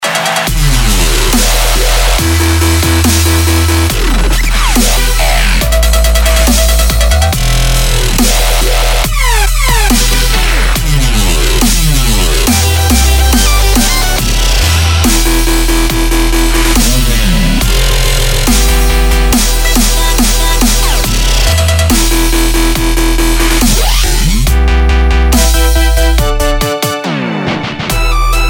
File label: dubstep